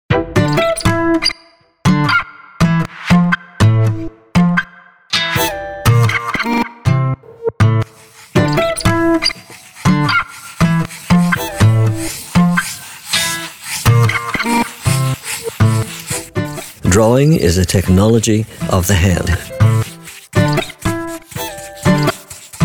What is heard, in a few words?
Drawing Song